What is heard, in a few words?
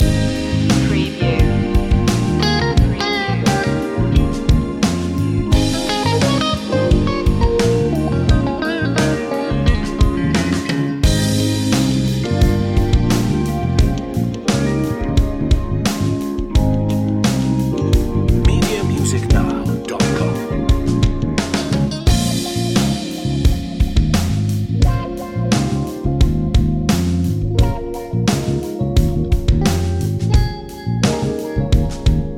funky love loop